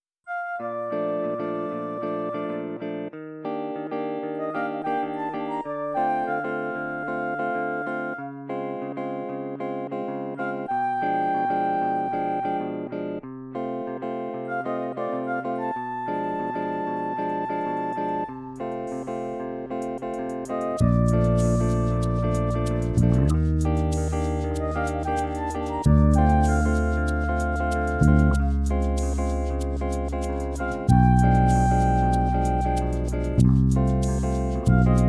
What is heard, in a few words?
Bossa